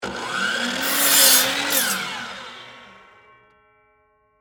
Miter Saw Cut Wood, Industry, Miter, Saw, Cut, 2X4, Wood, On, Run, Off; On, Run, Off, Short; Saw Sound Effects; BlastWave